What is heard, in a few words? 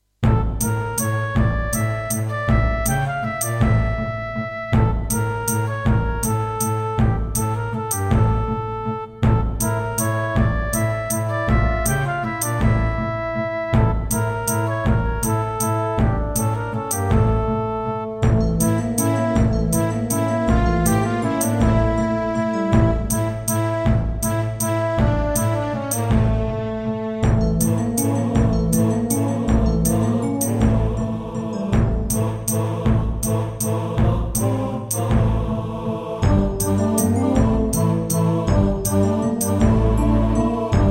Serdaco Yucatan FX (Turtle Beach Cancun FX Clone)